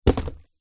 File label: foley cardboard drop lightly